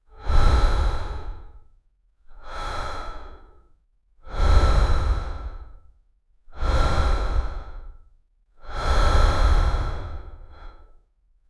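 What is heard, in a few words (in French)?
respiration douce régulière; regular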